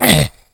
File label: pain; svencoop; justzombies